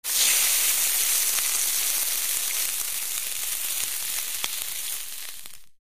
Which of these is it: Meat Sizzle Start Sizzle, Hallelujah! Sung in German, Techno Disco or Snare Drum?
Meat Sizzle Start Sizzle